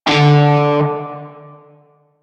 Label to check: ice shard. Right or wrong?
Wrong